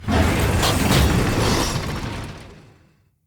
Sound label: Pollution Tower Pump Rotate A